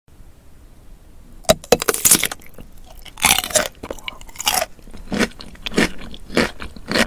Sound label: eating radish asmr relaxing sounds